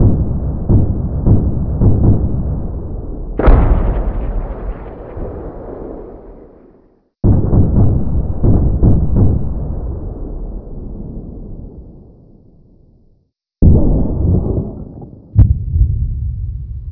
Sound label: distant fighting outdoors